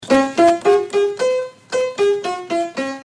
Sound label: pentatonic scale